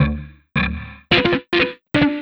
Funk Junk, Leads And Riffs; Sonic Foundry (Sony Creative Software); Synchro Funk

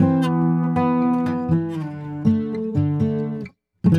Split Down, Acoustic String; Sonic Foundry (Sony Creative Software); Friendly Fire

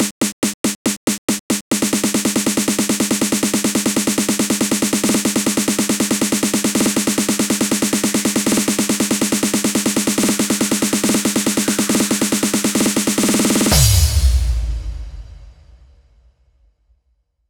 Snare Roll, Drum Loops 140bpm; Zero-G Trance Inducer

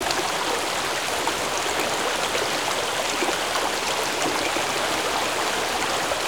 babbling, Ocean & Brook; SFX

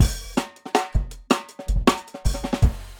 160 live jungle, breaks

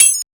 hi-percussion, techno; Techno ID; single-drums

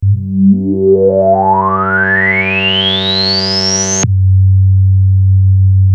ARP 2600 F#2, synthesizer; Hollywood Edge - Giorgio Moroder Rare Synthesizer Collection

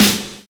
SD, Drums Dance & Groove

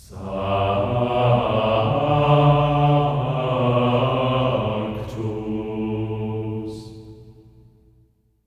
SANCTU G3; Spectrasonics; Symphony of Voices; GR SANCTU

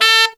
TENOR FX; Zero-G; Phantom Horns

